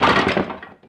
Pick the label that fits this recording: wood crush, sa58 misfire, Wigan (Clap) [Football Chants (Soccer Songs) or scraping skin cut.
wood crush